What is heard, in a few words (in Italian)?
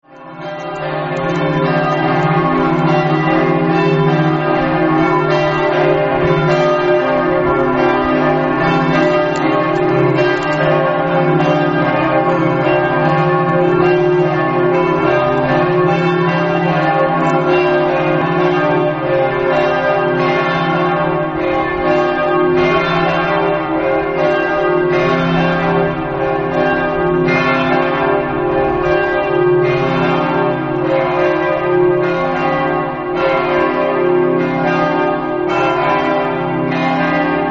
cividale, campane